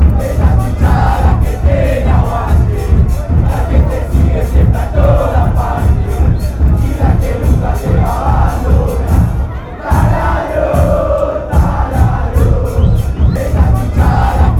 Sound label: the ones cheering you on